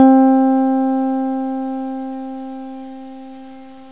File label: e piano; gmplay